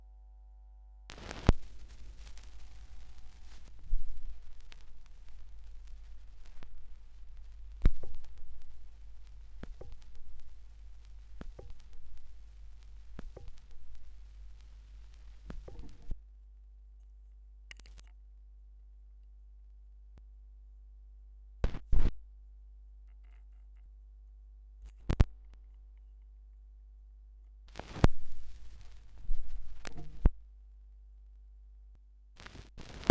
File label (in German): schallplattengeraeusche